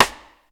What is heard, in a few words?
P STIK, FUNK KITS; Crypton - DrumBase5000